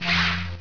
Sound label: SWORD